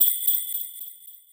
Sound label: BELL